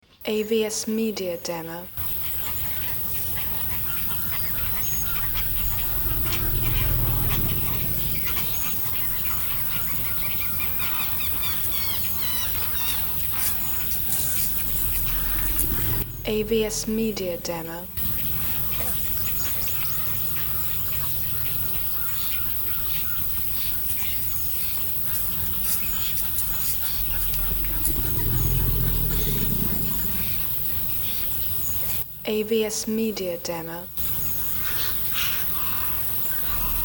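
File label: Atchafalaya Soundscape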